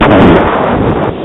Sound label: explosive